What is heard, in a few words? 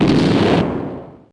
bomb; Fortress2; OnlineGame